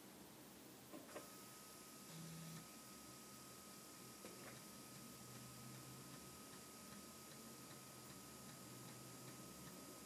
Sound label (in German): format und step burst, Floppy +3 disk; Mass storage; plus3 disk; zxsp